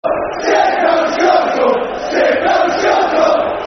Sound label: Sit Down, Shut Up, Football Chants; Stoke City; Stoke City Scfc